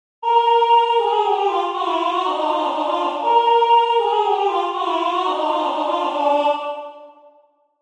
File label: alto, chorale